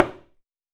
High Heel

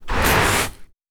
Sand gravel scrape

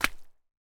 Mud

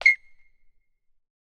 Basketball Court

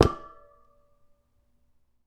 Metal

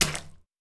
Puddle

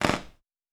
Creaky Wood Floor

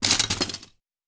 Glass

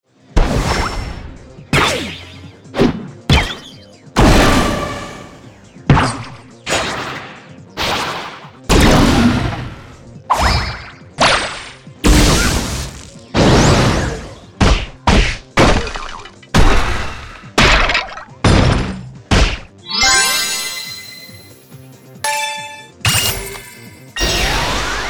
Casual Action Sound ACTION

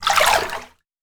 Shallow Water

